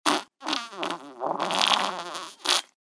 zvuk perdeja s bryzgami; Издавание человеком неприличных, но естественных звуков портящих воздух

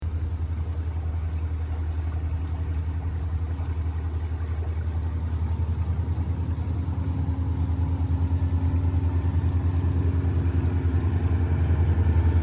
zvuk sudnar

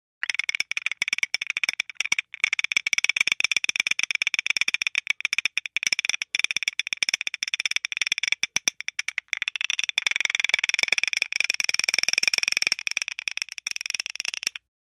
stuk zubami zamerz

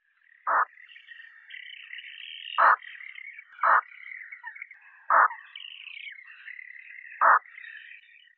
kolpica

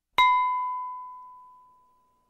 hrustal dzyn

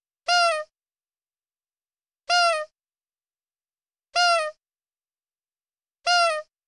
Zvuk nosa Pik pik PIK

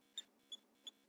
polevka

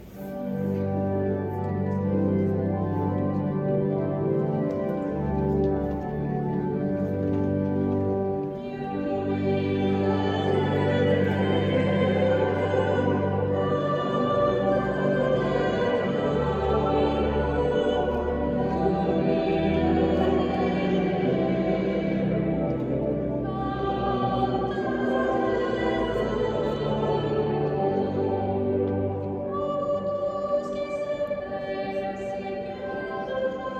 zvuk cerkovnogo peniya; Звуки церковного пения и проповедь священника

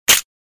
myshelovka zvuk

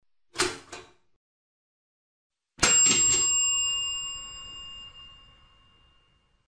toster zvuk

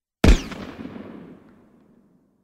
vystrel pistoleta s ehom

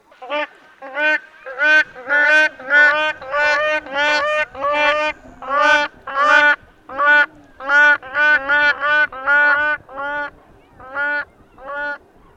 vencenosn zhuravl

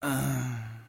Tihiy vorchun

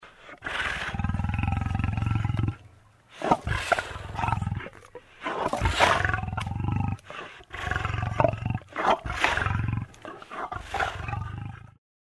kogda za spinoy lev

